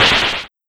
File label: Noise Snare